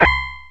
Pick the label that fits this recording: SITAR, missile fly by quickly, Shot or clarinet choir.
Shot